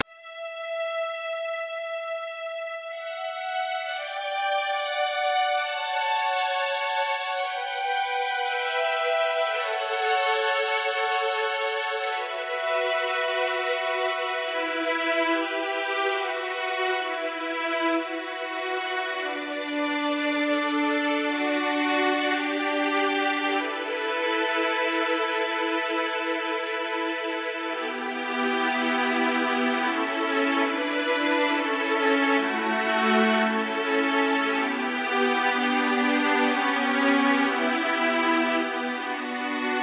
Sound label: Strings Sketch